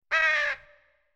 Caw

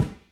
ball hit

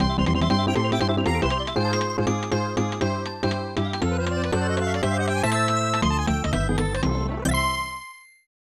Congratulations!; Panic in Nakayoshi World; SNES

